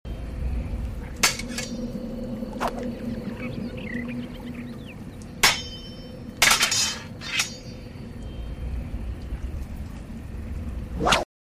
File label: sword; Celtic Sounds Of Middle Earth 2